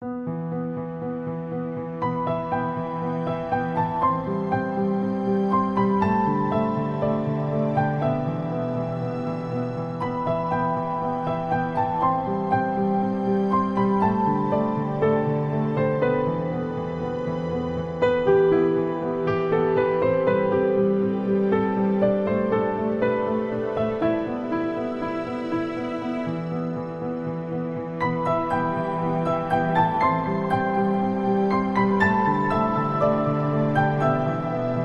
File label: short rocking; saronsongs